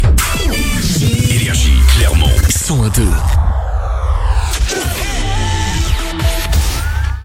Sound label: JINGLE